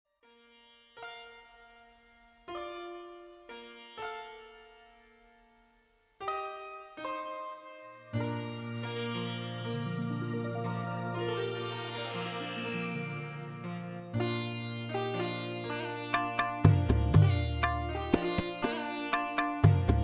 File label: Silent Indian Night